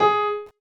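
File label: voice piano; gamerip; PS3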